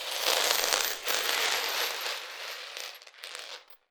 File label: Drag On Floor, Medium Wine Glass